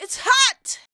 VOX, Singin

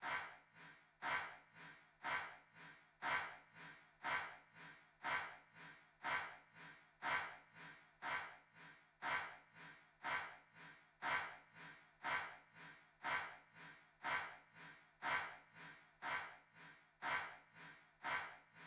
breathing, Drum Tracks, 060 Abmaj

